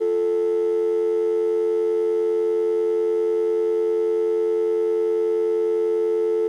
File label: Telephone Dial Tone